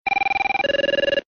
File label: office telephone